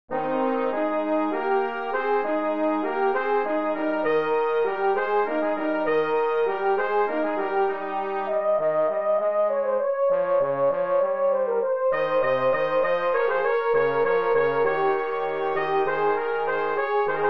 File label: hornpipe